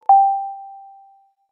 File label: wii home button sound effects, wii pop up